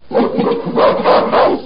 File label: primate, ambient